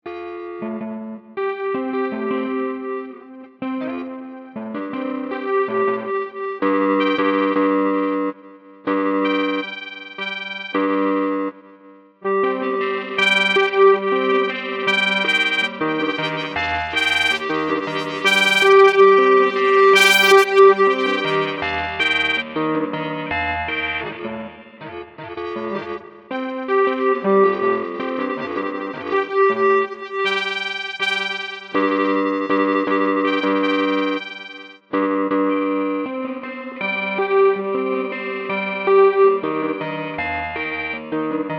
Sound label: guitar ring